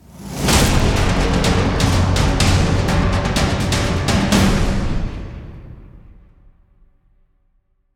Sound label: Stinger Fill